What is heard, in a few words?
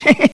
LAUGH; BattleSNDS